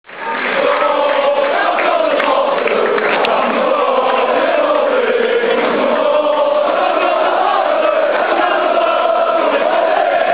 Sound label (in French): Nous seront champions de France